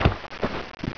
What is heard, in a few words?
COLLAPSE; homm2